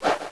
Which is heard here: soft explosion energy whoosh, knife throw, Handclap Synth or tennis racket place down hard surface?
knife throw